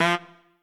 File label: Trumpet melo